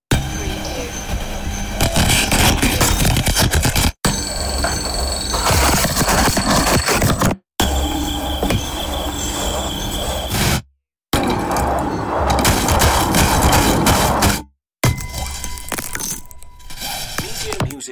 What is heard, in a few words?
chaotic error transmissions